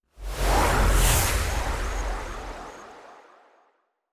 combo Tease; netent

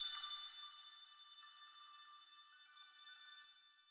count up loop end; netent

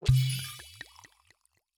spin button click; netent

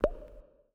reel bounce; netent

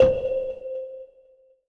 spin start

